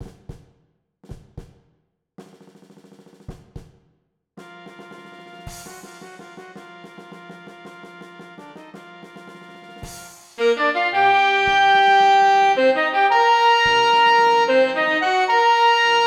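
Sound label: clarinet, tenor sax, alto sax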